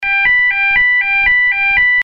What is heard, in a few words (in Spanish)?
sonido electronico